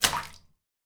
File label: Puddle